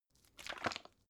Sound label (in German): bottle syrup shake single viscous, gastronomie